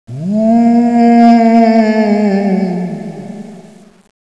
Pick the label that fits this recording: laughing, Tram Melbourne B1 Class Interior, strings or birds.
birds